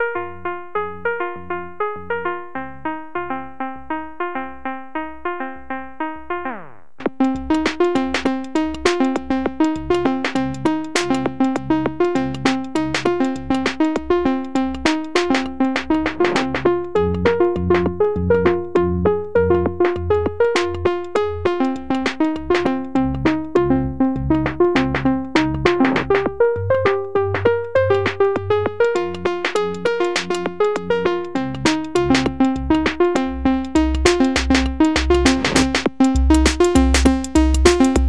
music cave noize; Enyo

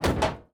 robot step; teardown ps5 windows xbox series xs gamerip 2022

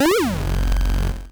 bread pickup

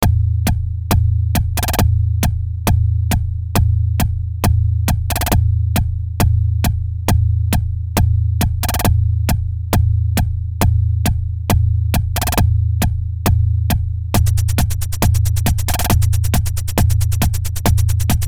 Level Complete; Coming of the Storm; Wolf 3D mod

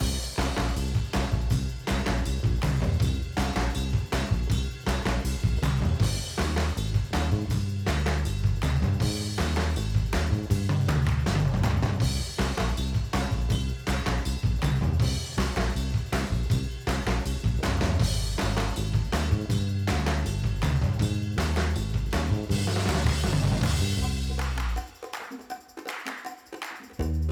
Island Chill

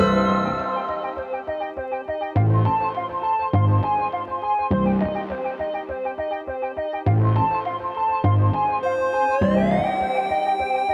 Claws Loop No drums; jenny leclue detectiv

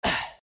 Hit; Russian Life Simulator

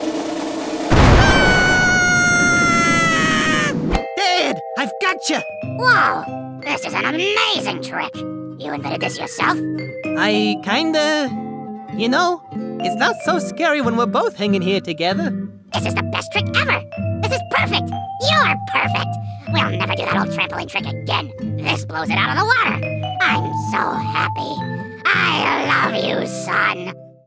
Fire Cannon; YoungClown

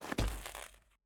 ice land; teardown; ps5 windows xbox series xs

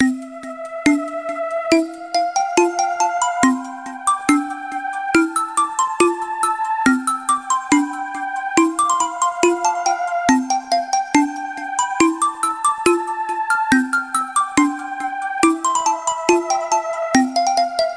BGM; Simple 2000 Series Vol. 36: The Musume Ikusei Simulation: Otousan to Issho; ps2

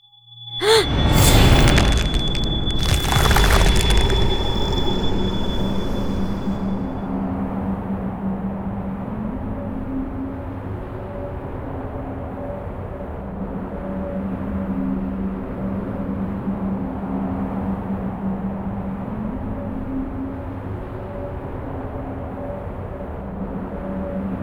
Bootsound; Severed

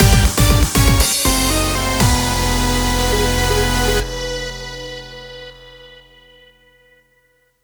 Defeat; ashes of the night

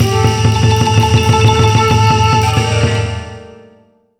Game Start

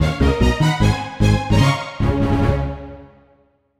Level Complete; Bomberman 1 & 2

